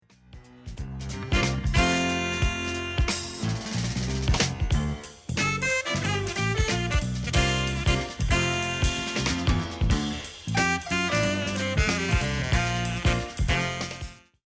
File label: funky gospel